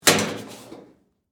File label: Trash Can Pick Up, Household, Trash, Can, Pick, Up, Metal, Garbage, Can; BlastWave